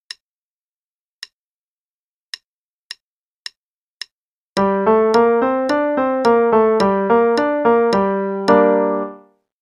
g minor Pattern (qn=108); 5-Finger Pattern; CM Level Prep; Daily Warm-ups

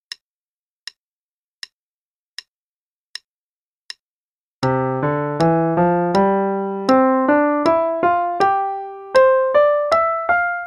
Major Pentascale Study, in C (qn=80); Faber; Adult Level 1

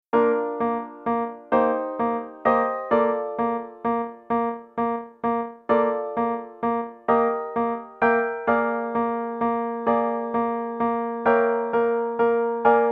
Play-a-long, in Eb, both parts, qn=130; Grand Prix; trumpet

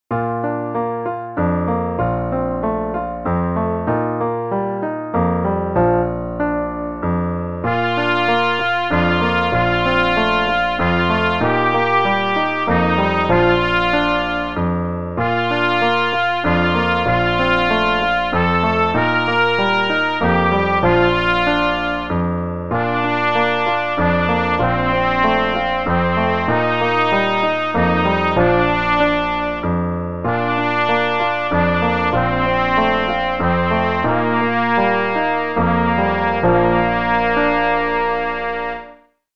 Lullaby (no click, qn=96); The Really Easy Trumpet Book

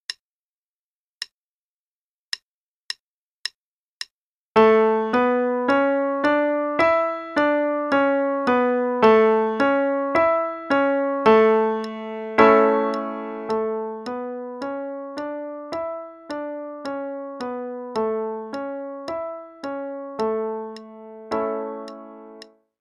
A Major Pentascale, qn=108; Faber; Adult Level 1

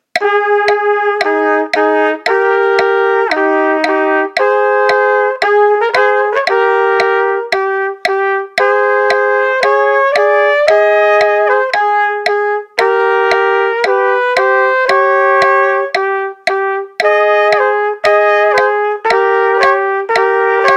Easy Duets, both parts, qn=146, trumpet

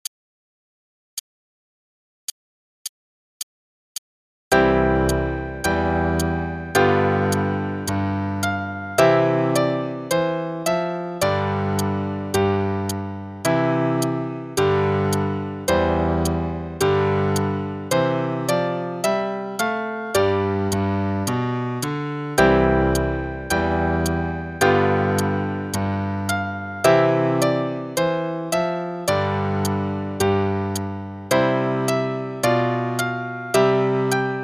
Trumpet Voluntary (both parts, qn=108); Faber Adult All-in-One; Adult Book 1 (All-in-One)

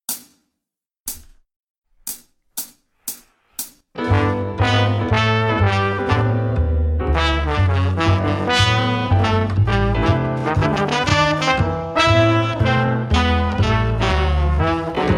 Swingle Bells, qn=120; HolidayTudes; Kenyon Carter; Christmas

